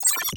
cpu interact

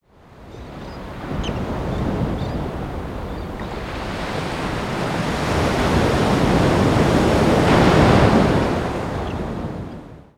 Beach; ambience; Binocular Path; gnarled forest

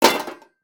Racer Battery; gnarled forest

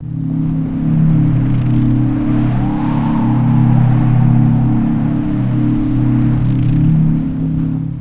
Race Snow Swirl

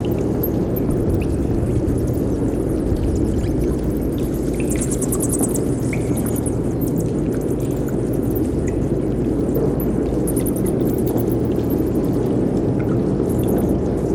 Cave

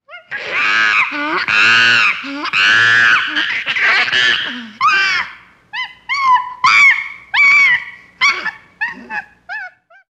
Jungle Primates; gnarled forest

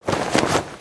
backpack cloth; aronwk collection; ndaudio

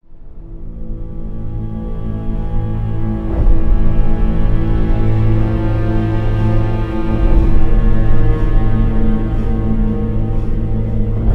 Battle Intro; GF Darkling

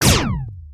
double blaster fire; weapons; aronwk collection; ndaudio

